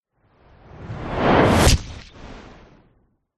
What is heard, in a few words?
whoosh fast dark thud squelch impact; sound design; zapsplat